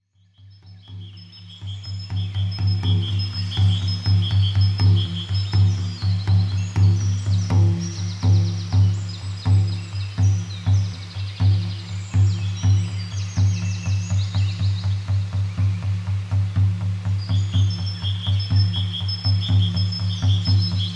extrait voie tambour chant oiseaux

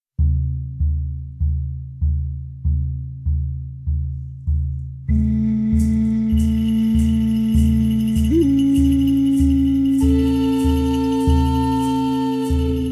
musique chamanique tambour

